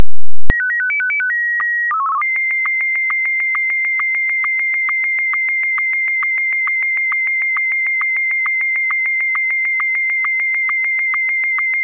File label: sstv; 22050 Hz, mono; 320×256